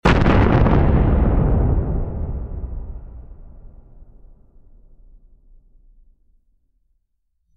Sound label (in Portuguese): som de explosao nuclear